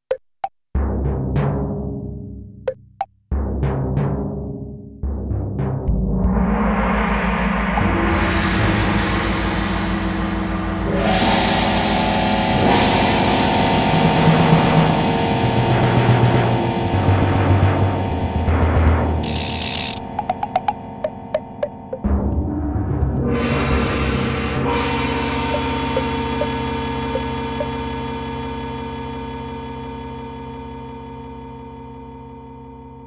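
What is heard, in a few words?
Orchestral Percussion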